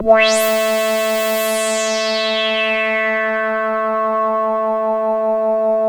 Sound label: DRONE A3; Best Service Alpha Dance vol.2